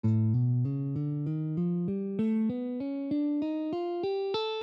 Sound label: A Arabian